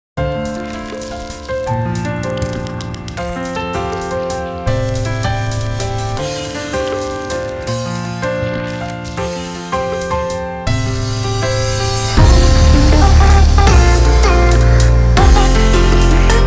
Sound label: Electro 160